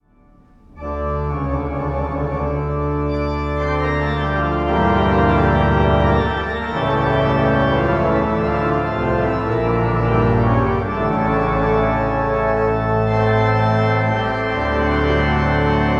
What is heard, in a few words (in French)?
Plain Jeu II 1er Ton